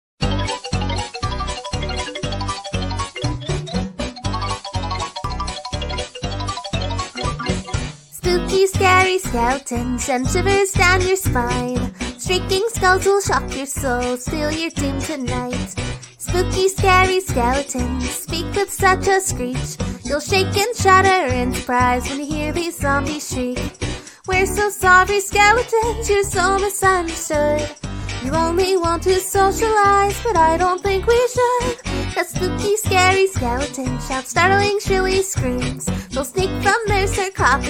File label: song cover